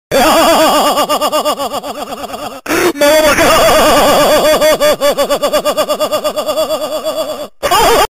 laughing; expunged

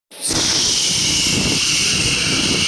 lazer

